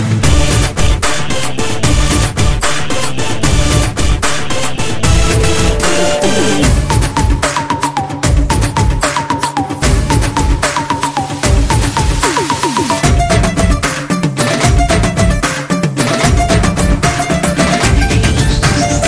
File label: BG Tone